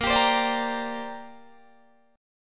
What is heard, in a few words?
A sharp 6th Add 9th, Guitar Open D